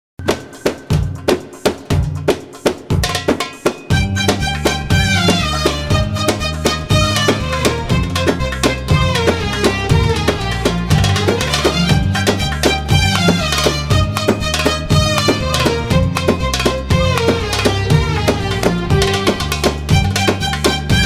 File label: Raks